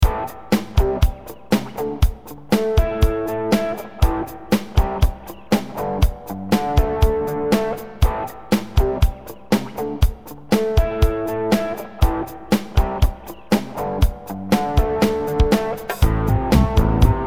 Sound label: rock